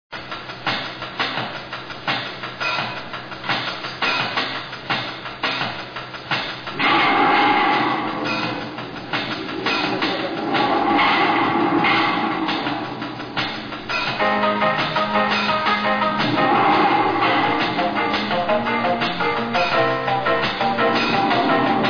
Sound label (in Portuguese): ritmada